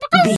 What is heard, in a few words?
chicken bwak